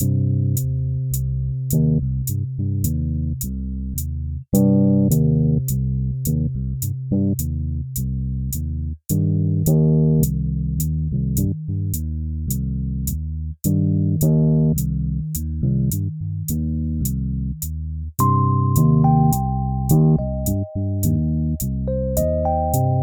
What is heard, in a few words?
Frog Town